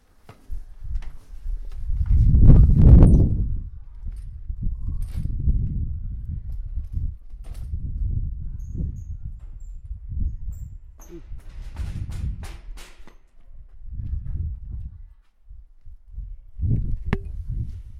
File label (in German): Suche nach den Superschülern mysteriöse Klänge; Suche nach den Superschülern (2)